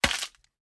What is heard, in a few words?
Action Hit; SoundsCrate